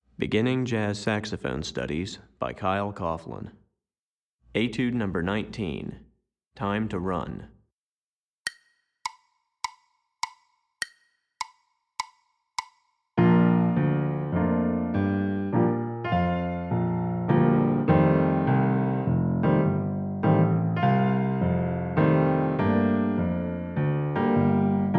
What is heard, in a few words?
Piano Part, Beginning Jazz Saxophone Studies Alto